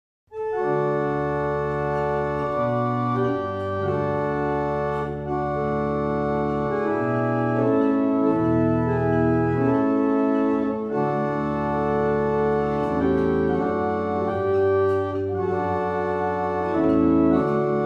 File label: Double chant, barrel organ; Brightwell